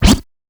normal hit whistle; osu

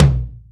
Tom; osu

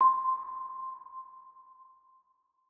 Bell

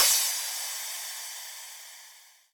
soft hit finish

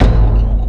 normal hit finish; osu

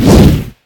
ball attack; osu

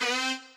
sax

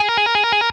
trill x 9 (11)

